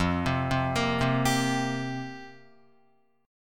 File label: F Suspended 2nd Suspended 4th F down Guitar; arpeggiated; JGuitar